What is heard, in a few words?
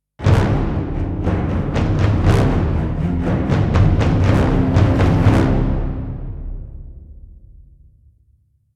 Epic Orchestral Stinger